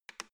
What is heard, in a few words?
embedded button press quick